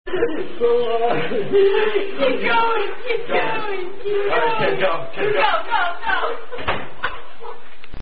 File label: Dizzy Stick, Non-Drinking Game